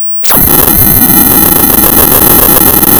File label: Sound of tearing paper, center